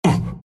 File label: grunts, ru